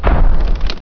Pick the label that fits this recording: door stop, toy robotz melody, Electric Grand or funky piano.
door stop